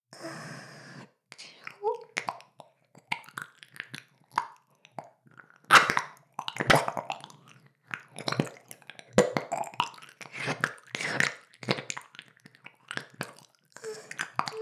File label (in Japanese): 耳舐め音声(LR逆ver); ASMR; ダメなメイド